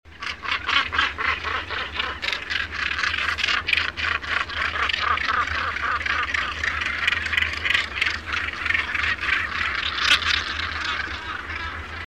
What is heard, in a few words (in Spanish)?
alcatraz atlantico